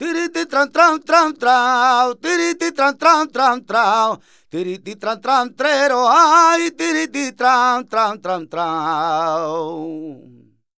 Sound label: voc f, Flamenco Vocals Male Cantaor; Male Cantaor 2